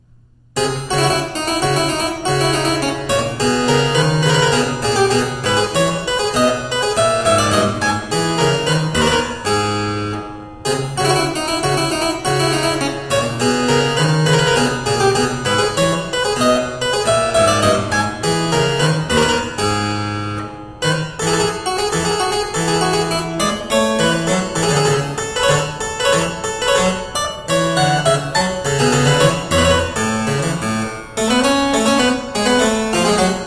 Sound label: Ouverture Burlesque Bouree, Harpsichord; Georg Philip Telemann; Der Getreue Music Meister